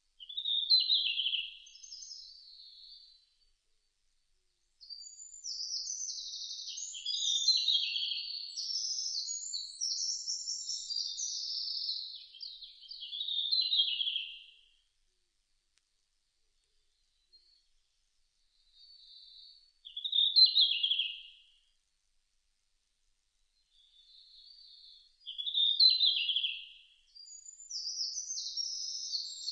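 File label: ruribitaki, red-flanked bluetail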